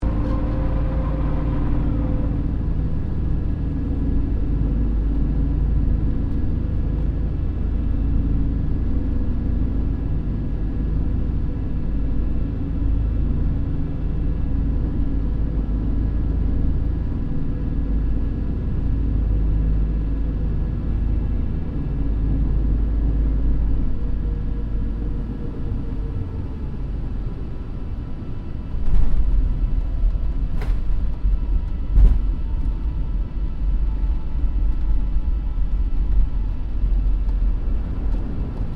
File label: 1955 LOCKHEED L-1049 SUPER CONSELLATION LAND INTERIOR; Connie, Super Constellation; Airplanes